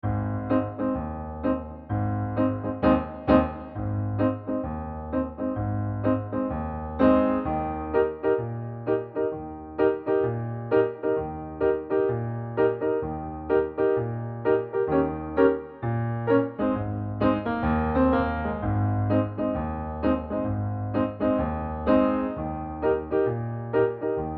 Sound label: Piano Ab Dur